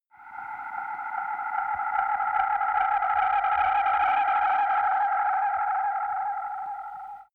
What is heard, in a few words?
flying security droid